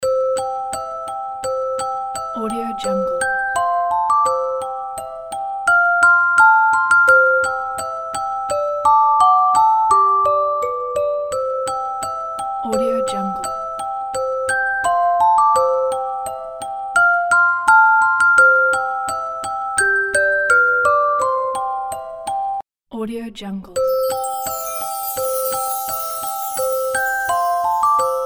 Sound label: music box jingle bells loop; soundfood